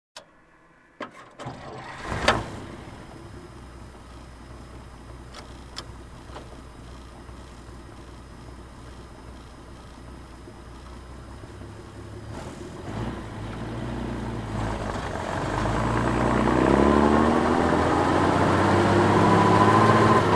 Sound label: Military Truck Driving from Cockpit